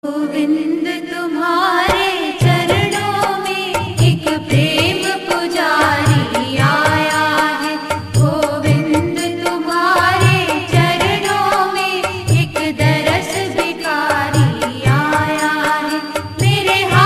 Bhajan

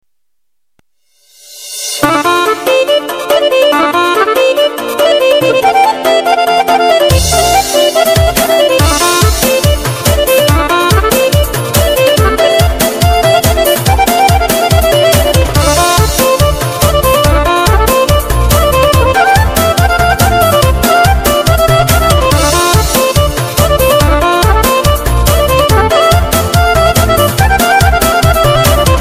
Ringtone

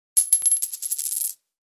Coin

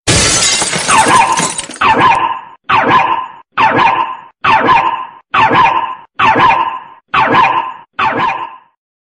Storm Car Secuirity

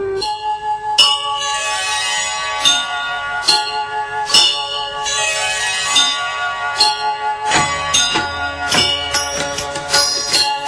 Bells

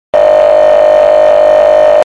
Railroad Tone; Csx; Up

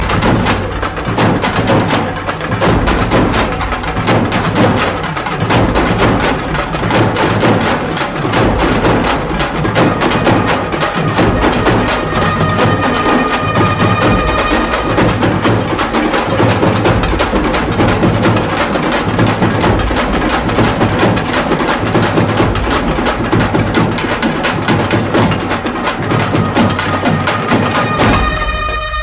Tamil Kuthu

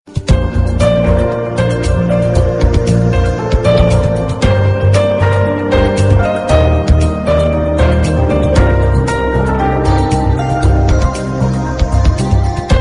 Piano

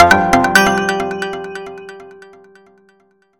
Pleasant Echo

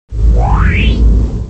Shield

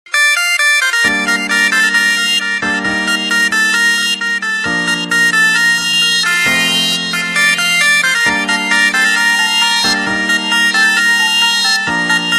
Ringtone dance; Wiko

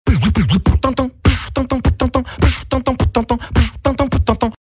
Beatbox

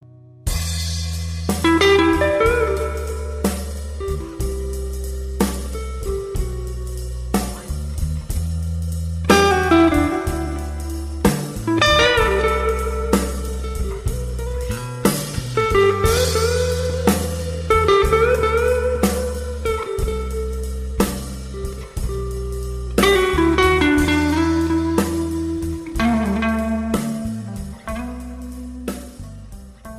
Relaxing Blues

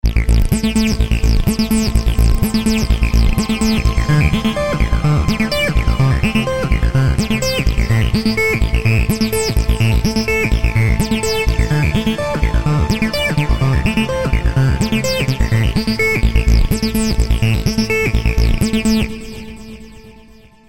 Trancetone

